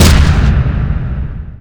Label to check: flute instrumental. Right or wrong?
Wrong